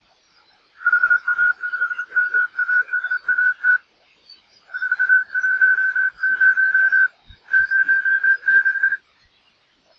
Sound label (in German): Rufzeichen MASAT 1; HTW; Optische Nachrichtentechnik